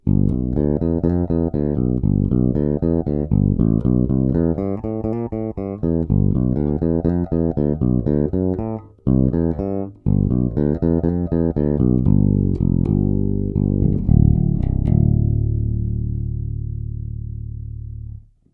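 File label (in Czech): Warwick RockBass Infinity 5 Fretless, Thomastik JR345, dvojcívka paralelní - nic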